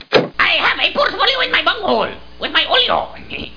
SWITCH; Quaaake 2; Quake; Beavis; BUTTONS

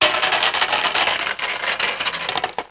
Eggs; The Pea; SoftKey

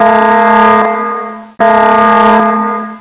Siren; Die Hard

